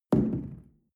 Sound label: shell place on tablecloth wobble medium short; berufe; gastronomie; soundpool